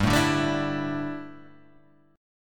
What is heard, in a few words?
G 6th Suspended 2nd; G6sus2 strummed; JGuitar